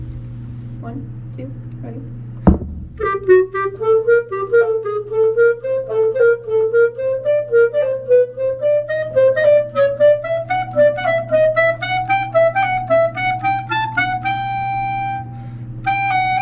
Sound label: flute